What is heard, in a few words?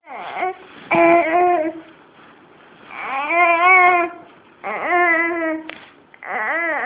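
baby hungry, male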